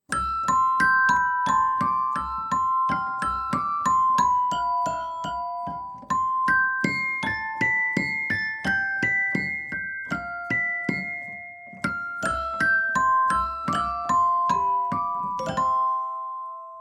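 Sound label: Music Box, Galop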